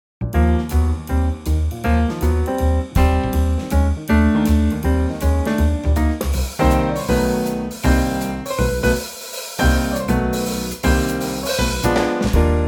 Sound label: Eb Trio; key - Eb - vocal range - G to G